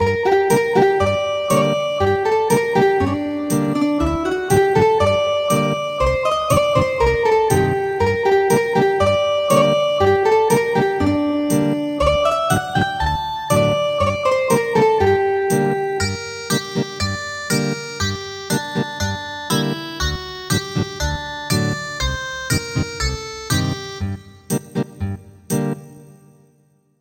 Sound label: Ethnic Guitar; Canaries